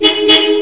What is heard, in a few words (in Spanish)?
claxon